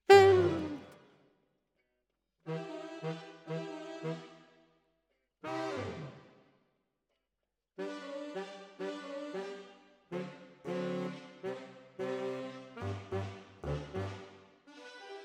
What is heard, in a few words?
Alto, RNR for piano